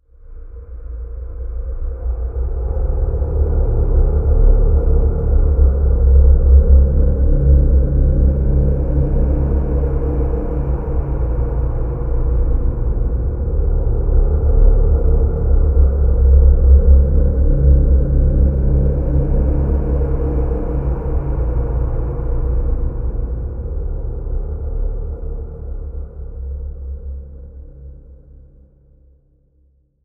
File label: environment graveyard stinger winds long; Aragami